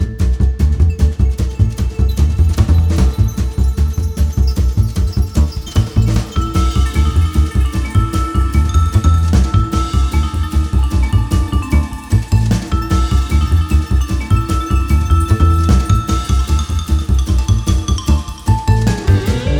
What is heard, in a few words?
Loop; Doodle Jump Space Chase; Mu lvl Planet 2 Shubby